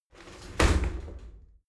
shower slide doors close heavy; objekte; tueren

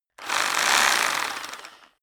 rock drill hammer rev double; soundpool

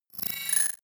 sweep rattle click; sweeps-swells

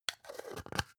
spice jar scratch close

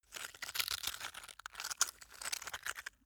stone scrape long; natur-tiere; steine

